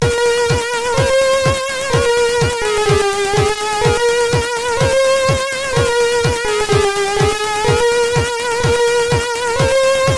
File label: X-mas special sid CSG8580R5